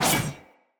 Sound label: Sword Whoosh Heavy, Weapons, Player, Swords; Garren Sword